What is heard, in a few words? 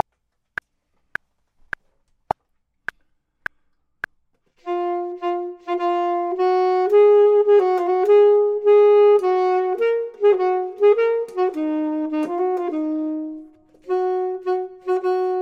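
top, Saxophone and Clarinet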